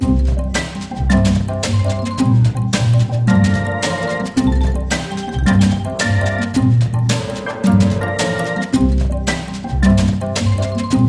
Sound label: Jungle Level